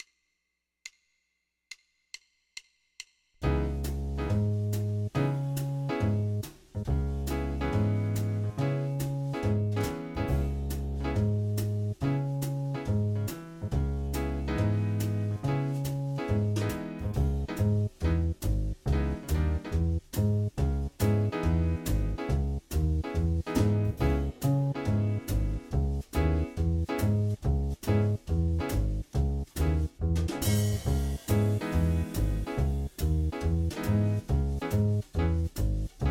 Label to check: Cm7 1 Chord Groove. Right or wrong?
Right